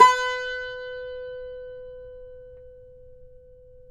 CUMBUS B, Guitars; Spectrasonics; Hans Zimmer Guitars VOL-2

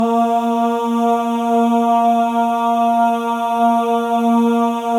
MALE AHS, Symphony of Voices; Spectrasonics

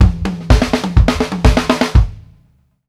STRGHT KI, drumworks fills; Sampleheads; New York City Drumworks VOL-1; KD FILLS 120

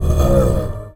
BUEHUH, VOICE; Rarefaction - Poke in The Ear With A Sharp Stick

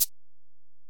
Ac Perc, Drum Machines Alesis DM-PRO

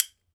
B. BONES, Celtic Percussion

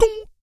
VOC PERC, Vocal Planet Jazz FX BASS PERC

